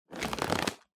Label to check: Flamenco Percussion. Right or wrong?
Wrong